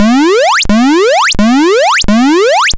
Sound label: Apple II FX Invader, Chiptune